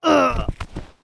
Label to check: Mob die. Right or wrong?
Right